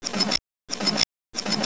glock silencer; lightenwoods; silent zhildor demo

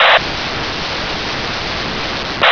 static; svencoop; portal house

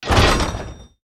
metal door close; svencoop

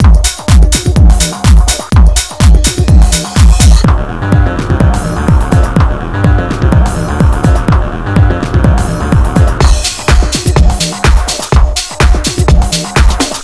music track

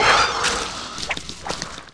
tail swipes, aliens drone; svencoop

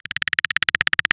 pred clicks; svencoop; avp2pred

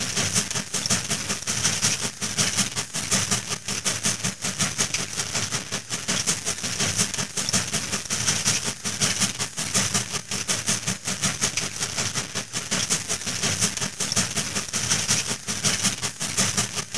train

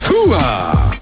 ruahh

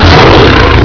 attack growl big; svencoop; classic; pinky

